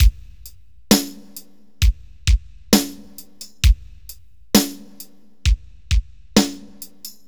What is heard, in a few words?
Straight Kit, World Pop Beats